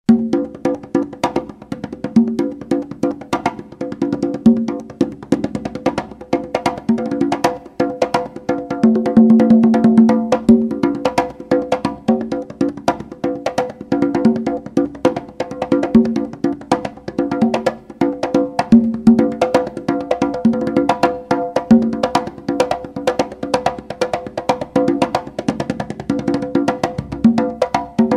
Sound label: Spicy Congas, Congas; Timeline: 2 / 3 Rumba Clave; Instrument: Congas